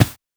walk carpet

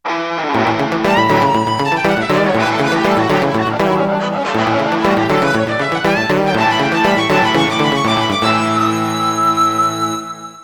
Guitar Riff; nancy drew danger by design windows gamerip 2006

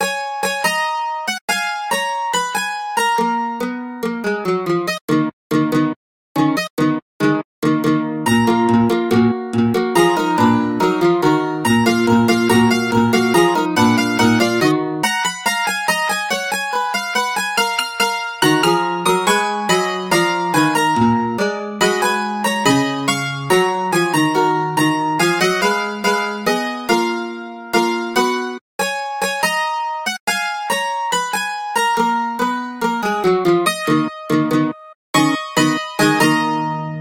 Mandolin; Badh'r Kilnfest; The Bard's Tale Remastered Trilogy

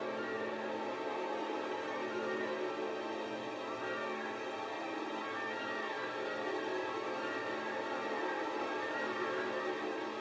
Tension; Stage 02 Predator Hole Tension 01

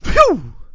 Pig Relieved; Angry Birds Space

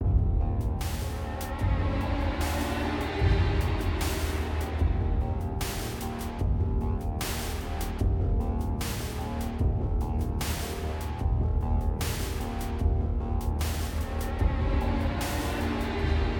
Combat; Necropolis

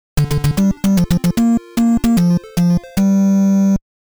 Keys Collected; king kong 2 ikari no megaton punch nes

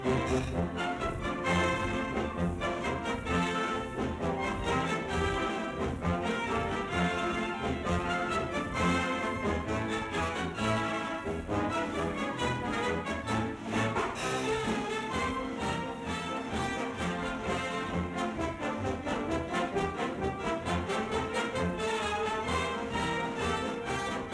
Vinyl Music

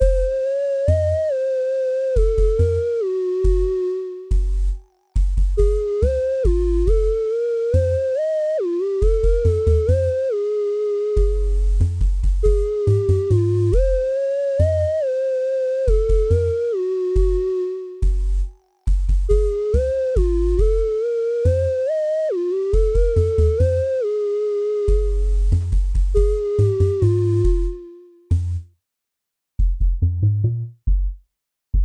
Robot Bass+Lead

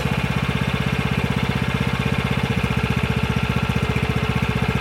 Generator Running; Granny 3; Android

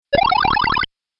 COIN; Elevator Action; arcade

